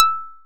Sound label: plucked, programmable speaker